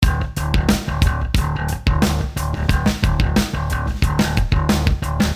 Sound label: depressing rock music